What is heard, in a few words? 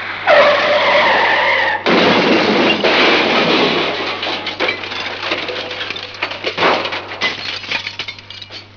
CRASH